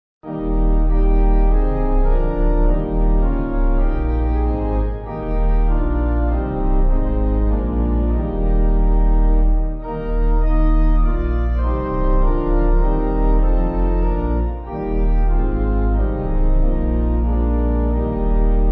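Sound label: Pipe, Free Music Backing Files for Churches - Piano, Band, Pipe Organ; Forgive Our Sins; Dundee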